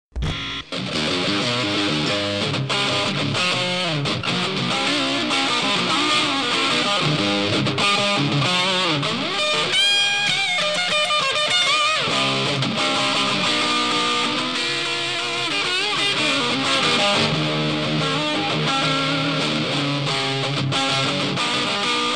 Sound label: riff, rock; ringtones; vw